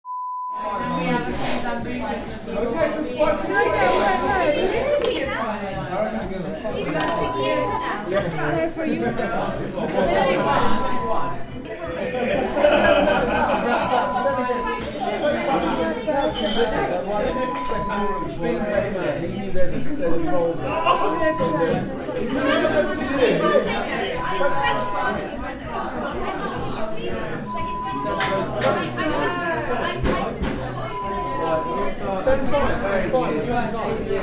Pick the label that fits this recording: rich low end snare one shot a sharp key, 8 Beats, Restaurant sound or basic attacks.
Restaurant sound